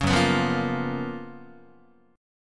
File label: F sharp Major 11th Guitar; F#M11 Chord; strummed